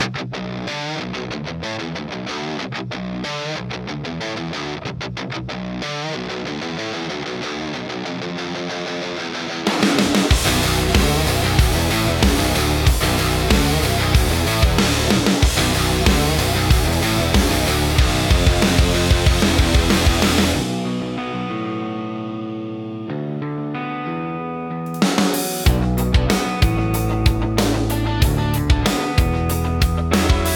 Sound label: action rock theme soundtrack